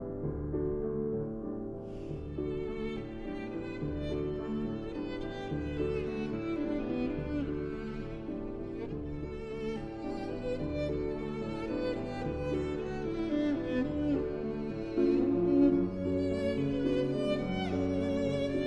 Violin and Piano in D

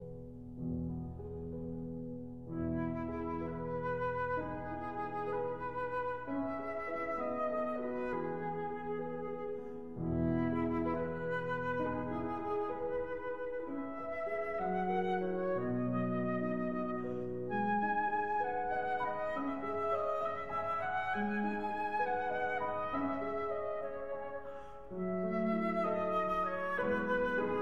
Berceuse (Lullaby) for flute and piano

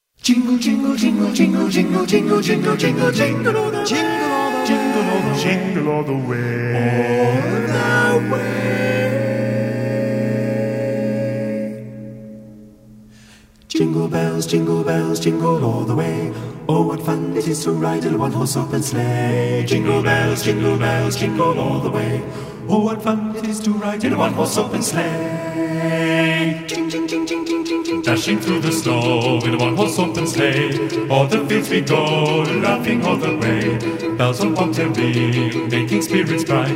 Jingle Bells (a cappella); TRADITIONAL

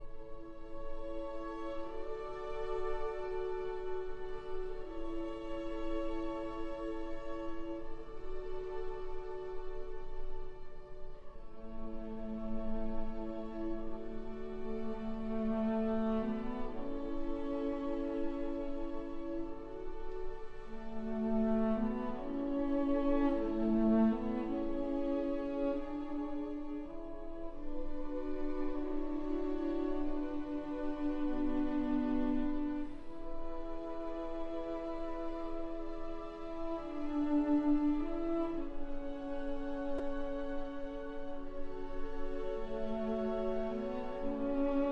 for cello and strings

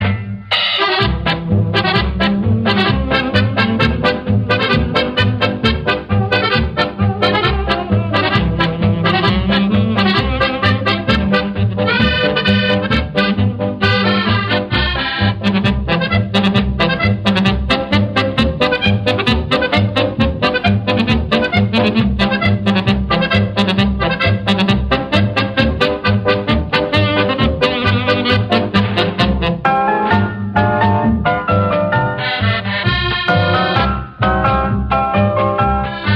Polka

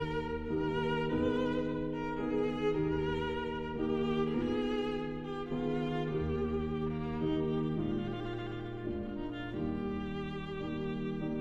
Sicilienne for violin and piano

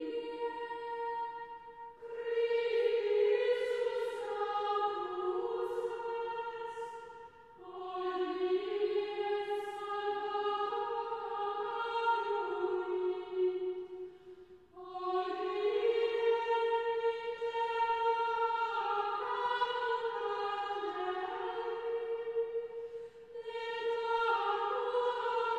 Chant Antiphon; Traditional; Chester Processional

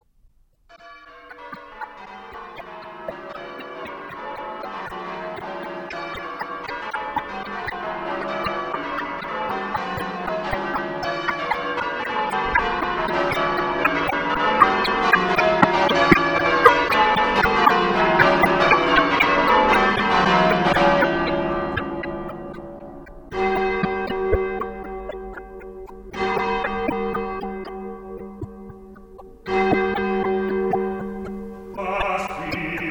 Past Three a Clock (opens with church bells); Traditional (English)

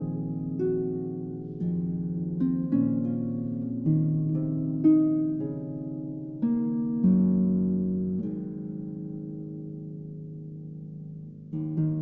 Harp Concerto Lullaby

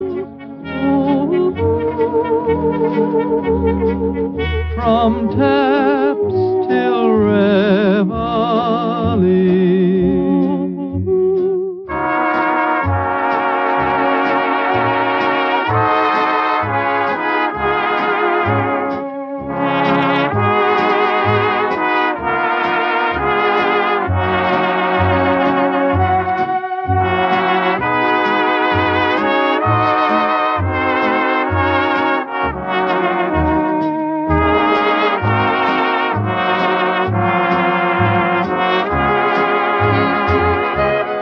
Group Vocal

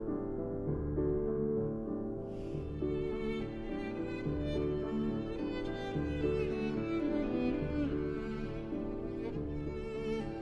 Violin and Piano in D

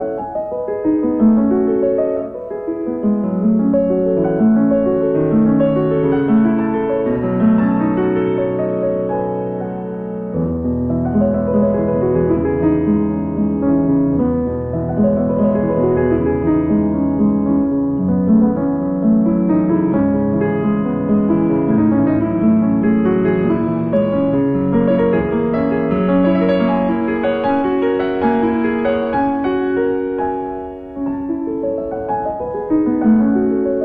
piano, Arabesque in E major; Arabesque No. 1

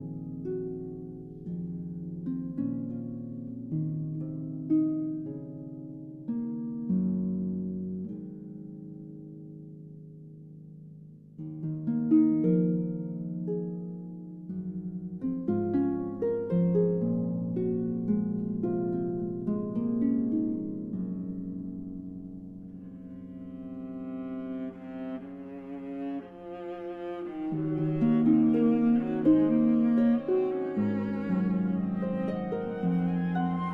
Harp Concerto Lullaby

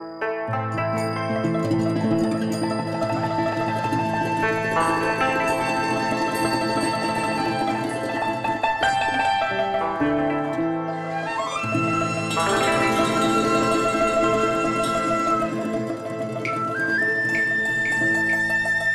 TRADITIONAL Chinese, Picking Flowers

